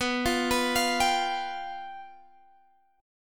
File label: G 7th B